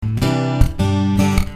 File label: Punchy C,G